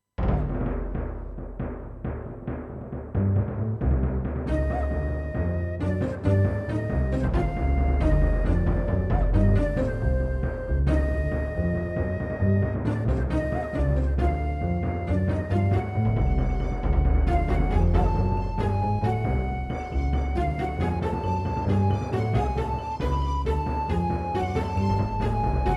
SC880; QFG3

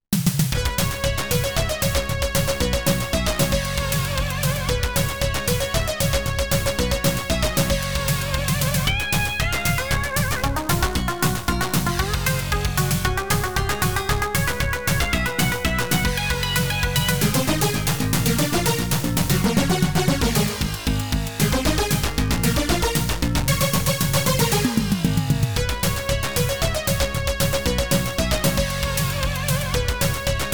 OPL3; CYBSPHERE

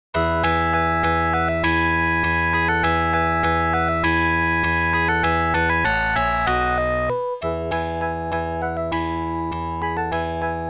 ADLIB OPL2

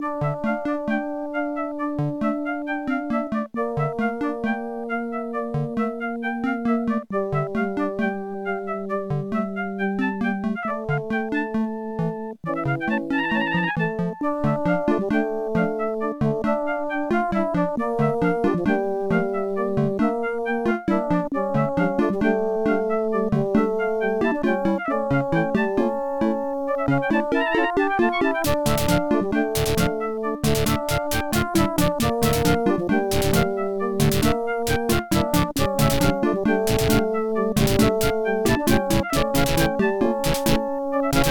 OPL3; Tetris

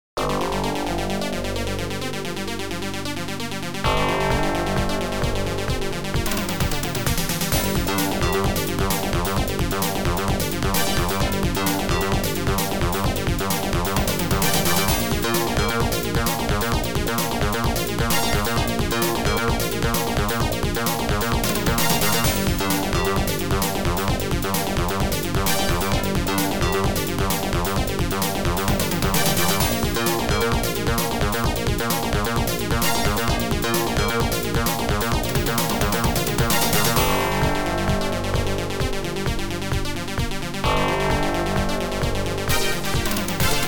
OPL2; One Mustn't Fall; Tyrian

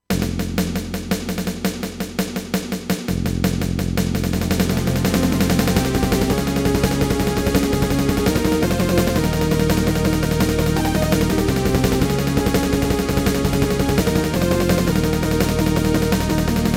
SC880; dune 2 the building of a dynasty

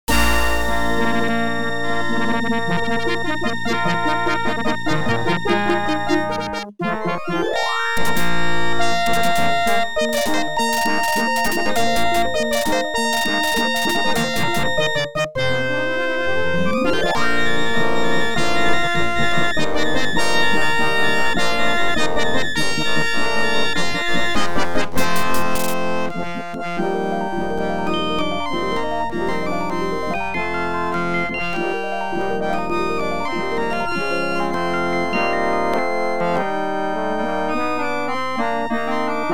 OPL2; YAMAHA OPL2; Star Wars: X-Wing

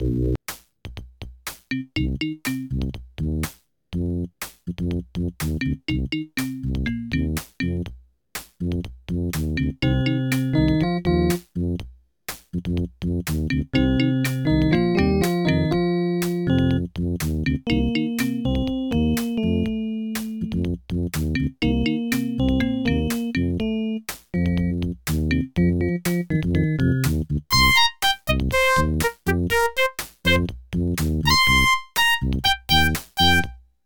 OPL3; sim city 2000

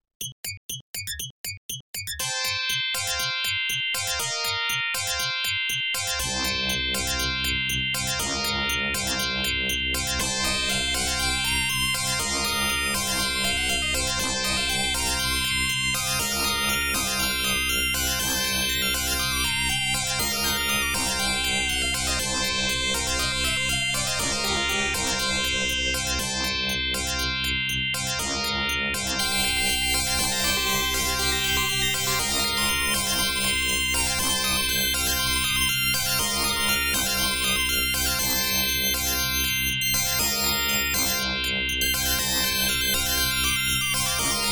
OPL2; Alien

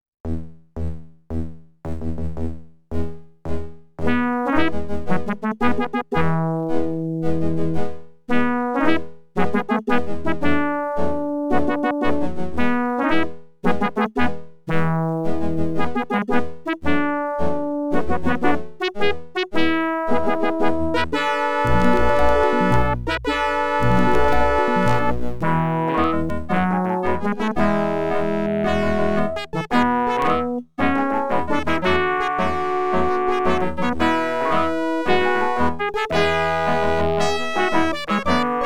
OPL2; X WING

